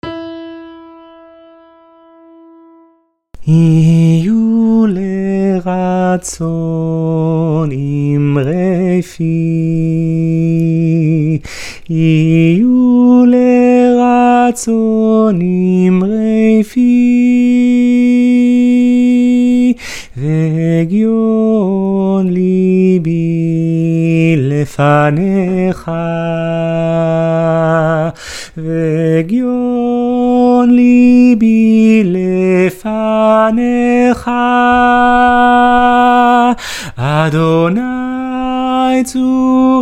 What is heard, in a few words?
yihyu lratzon soprano; BCC choir